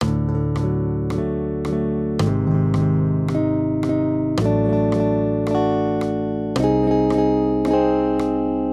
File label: maj triads; D-Ab; accompaniment